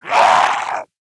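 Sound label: pain, zombie male; nmr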